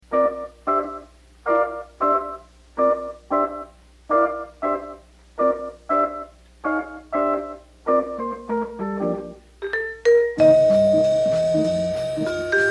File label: Vibraphonist